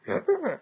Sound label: sound hit; kabocha; replicaisland; android 6.0.1